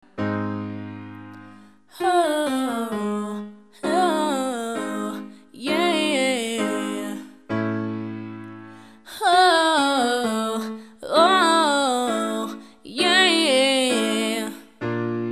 5 note falling scale

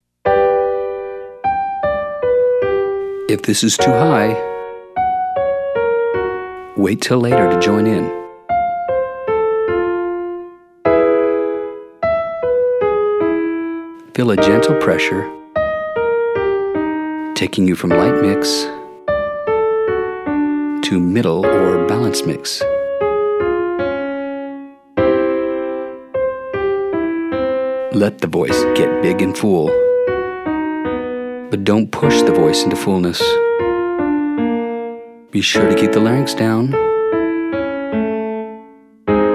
Your Turn female; Singing

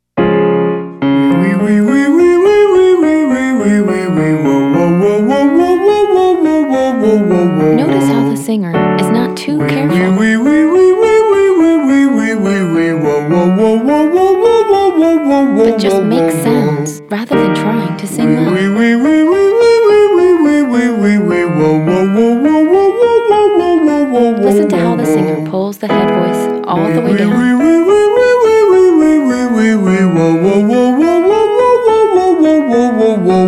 Exercise Male example; singing lesson

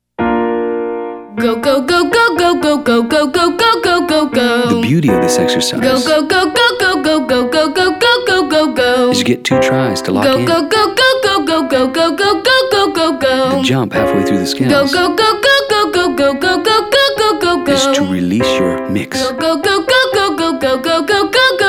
Exercise 2 go go female; Singing

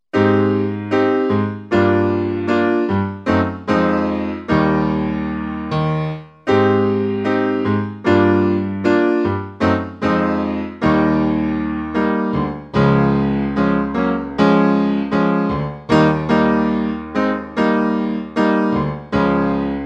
Improv chords Solo

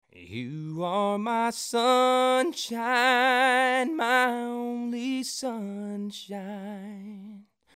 dynamics in country

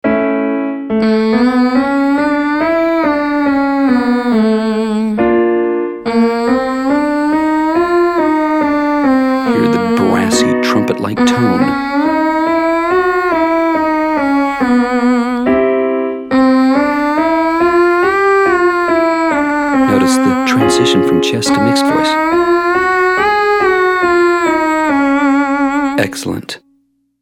Exercise NG females; Singing

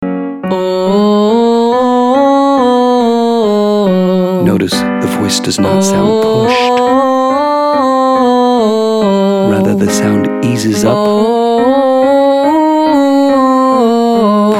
Exercise Irish Oh Ladies; singing lesson

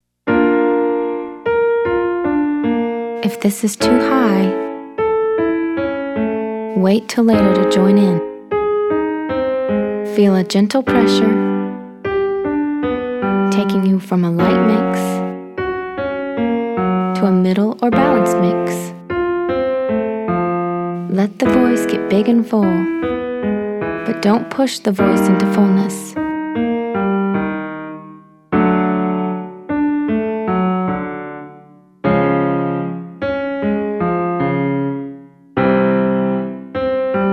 Exercise Your turn Male

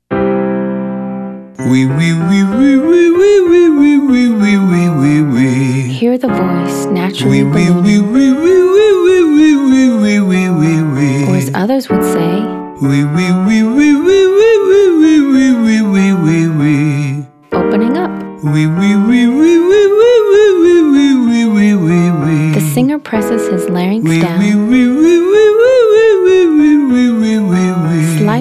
Exercise Oui male; Singing

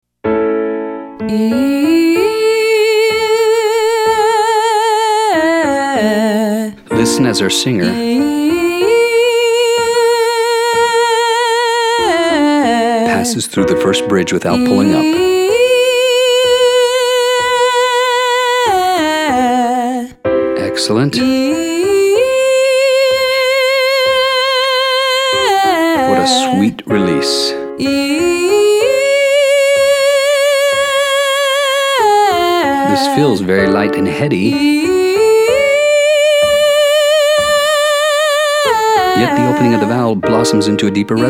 Exercise 6 ee to eh female; Singing

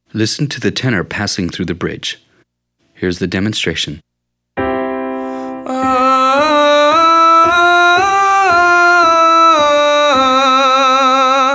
Exercise 1 System of Bridges

